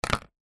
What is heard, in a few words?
papadum set down on empty plate